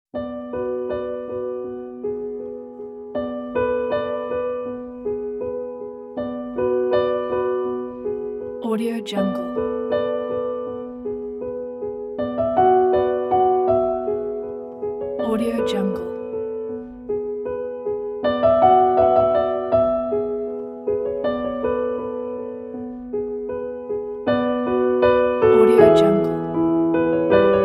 ancient piano

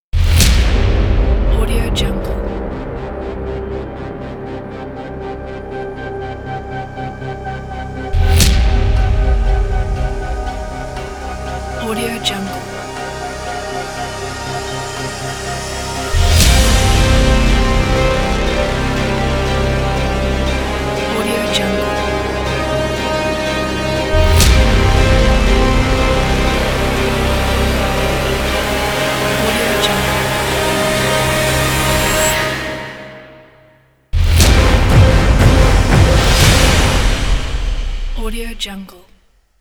big epic cinematic opening

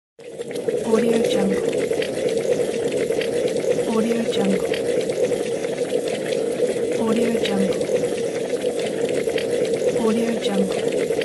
boiling water; studio-j

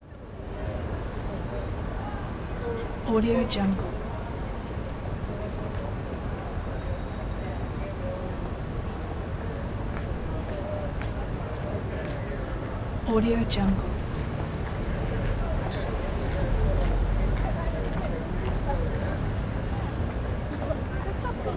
london street public walla car bys honks busy; prosoundfx

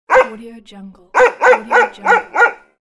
dog barking

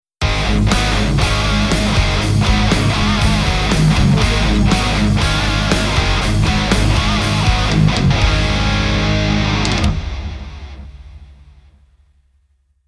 hard rock logo; stereogull productions

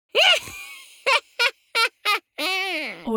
cartoon vocal witch laughter sneaky; smartsoundfx

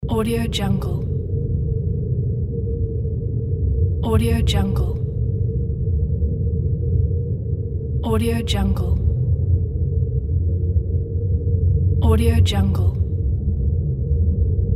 underground

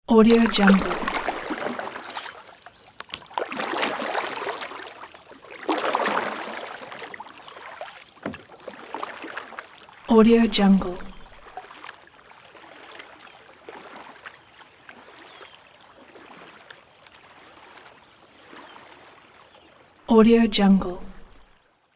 kayak paddles away

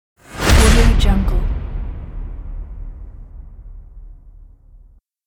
hit; osproducts

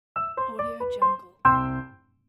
all done piano logo

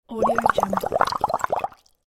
bubbles

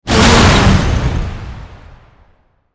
explosive aftermath; sound ideas

